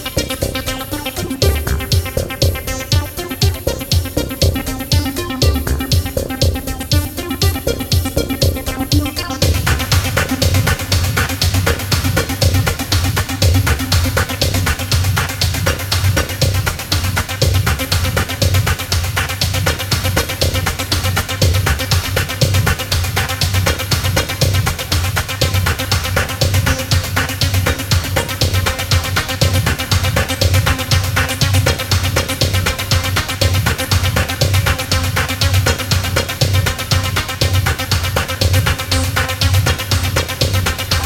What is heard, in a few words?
Digital Grit Box